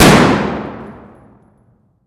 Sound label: rem700 indoor distant; Escape from Tarkov